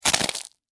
Crack Crunch Soft, Texture, Crack, Crunch, Soft, Ice, Cream, Cone; Food; BlastWave